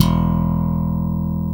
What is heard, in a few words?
BRITE BS E2, FINGER BS